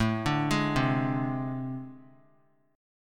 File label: A Minor Major 7th Double Flat 5th, down, Guitar; AmM7bb5; arpeggiated; JGuitar